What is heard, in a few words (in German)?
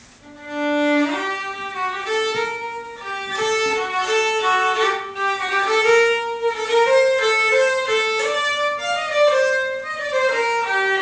Moll-Zwiefache